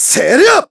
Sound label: Big Attack; Ghirahim's heavier attacks; Skyward Sword